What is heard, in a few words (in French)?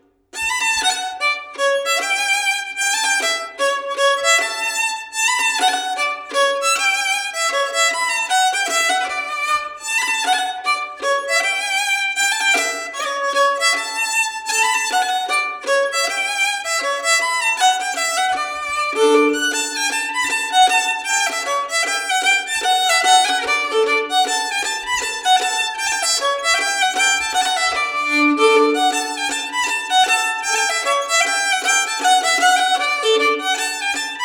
Mazurka